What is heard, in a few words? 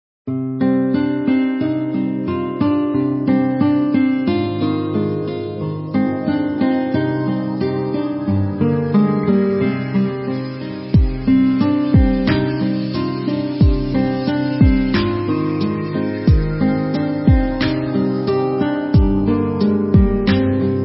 Song Instrumental